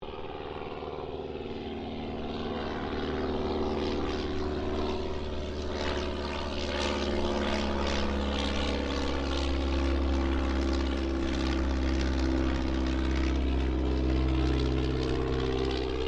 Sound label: AIRCRAFT DH82A DEHAVILAND TIGERMOTH PASS; RAAF Tigermoth; Airplanes (Sound Effects:Vehicles)